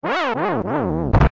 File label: hero dying; zsdx